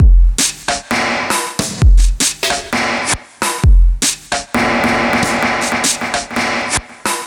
Bpm132 Dlay Break, dubstep drum loops

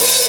Open Hihat

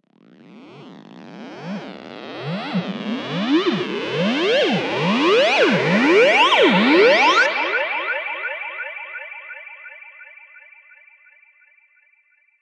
swoosh; Loopmasters; Funky Underground Vol 2; FU2 SWOOSH FX

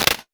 Clap, Dubstep One-Shot Drums

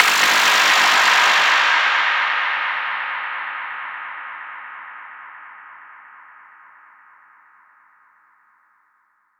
FX Downlifter

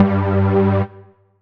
Synth Shot G

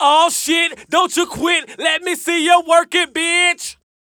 VOX

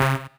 Synth Shot C; Mutekki Media; Vengeance Electroshock Vol.2

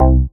Bass Shot C

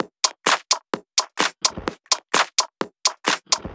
Loop, 128 BPM

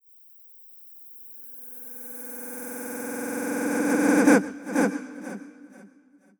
FX Uplifter

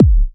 Kick

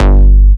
Bass Shot G

